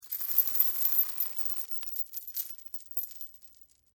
Chain Pick Up, Industry, Chain, Pick, Up, Wood, Floor, Light, Chain; Factory; SoundEffects-BlastWave